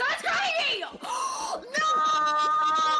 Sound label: noooo